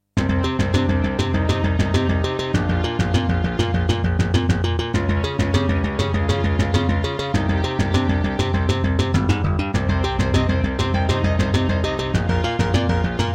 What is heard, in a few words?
Serdaco Dreamblaster S1; Hocus Pocus